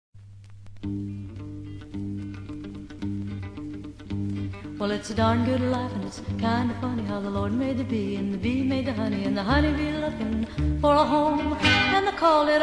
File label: guitar